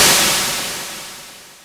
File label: NOIS WAV, INST WAVES